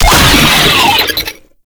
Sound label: Player Spotted, Vocals; Enemies; Warframe; Proto Infested; Brachiolyst